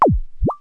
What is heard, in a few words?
two drips; Wired for Sound Pro CD; Aristosoft, Inc.